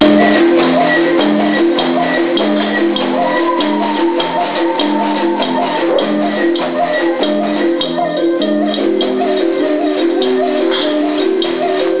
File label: wagogo choir